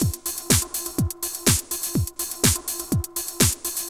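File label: drum loops, left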